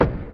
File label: Foley lo